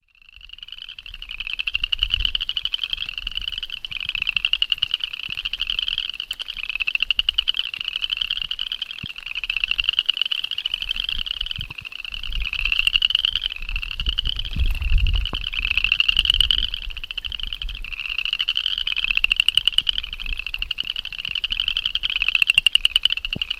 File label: frog, Call a "thumbnail raking a plastic comb" (from low to high pitch); Pseudacris fouquettei